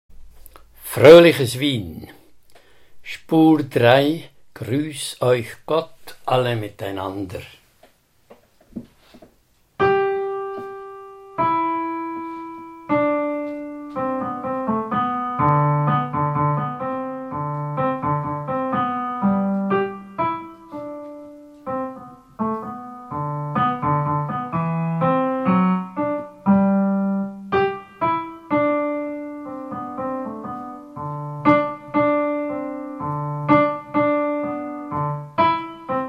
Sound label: Tenor; Wien